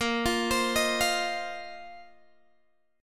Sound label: A sharp Suspended 2nd Suspended 4th down Guitar Open G